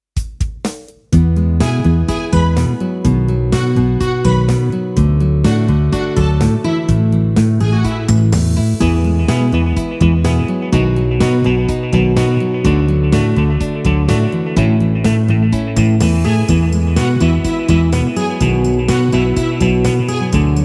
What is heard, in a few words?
instrumental